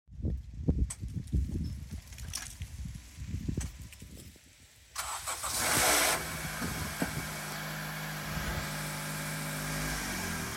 2015 accord lx fails first start faint clicking sounds battery good start